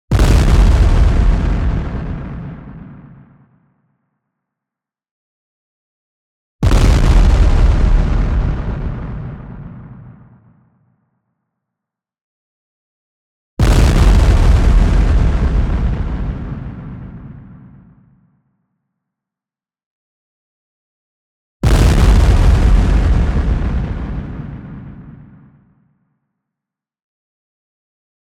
EXPLOSION LONG Gnarly White Noise Sine; boom library; Anime Essentials

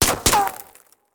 Bullet Ricochet; Sound Ideas; Guns

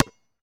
Street Ball Bounce Blacktop, Street Basketball; SFX Kit

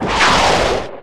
Rocket Launcher Shot; SFX Kit; Weapons

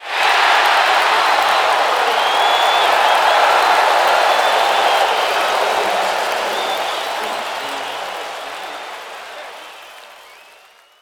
BMX Crowd Cheer Large; Crowds

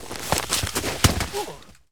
Bodyfall Weeds; SFX Kit; Bodyfalls

